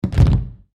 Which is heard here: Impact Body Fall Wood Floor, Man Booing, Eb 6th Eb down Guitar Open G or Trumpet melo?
Impact Body Fall Wood Floor